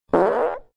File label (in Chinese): 拉屎